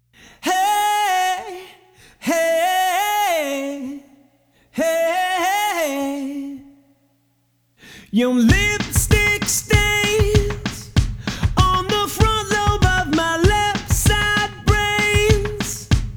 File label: GuitarLess